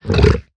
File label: hoglin idle; Minecraft; 疣猪兽（我的世界）